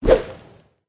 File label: jelly fish dodge